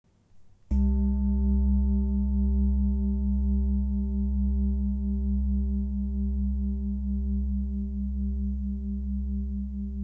erleuchteter Buddha

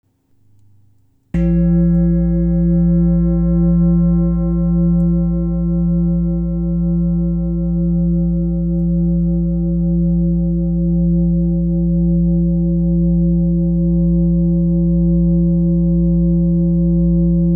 Grosse Lebensblume Fussschale

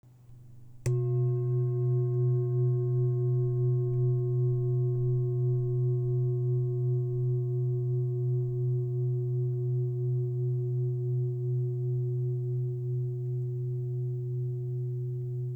Klangprobe; Manjushri

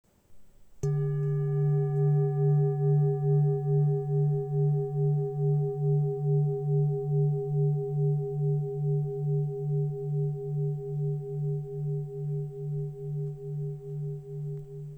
toller Klang

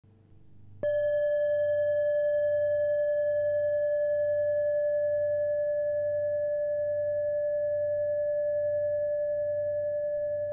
245g Sakralchakra Doppeldorje Nepal 600Hz

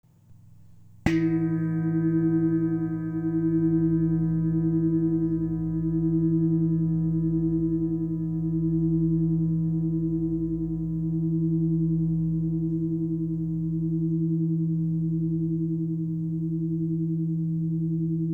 Fussklangschale; big Buddha

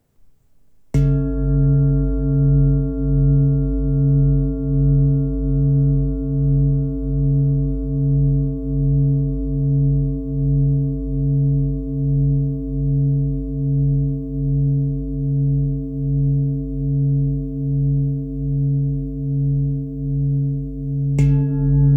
Klangprobe G 49Hz; Blume des Lebens

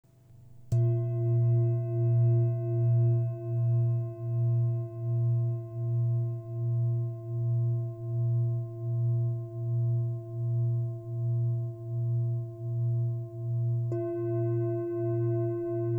Shanka Klangprobe

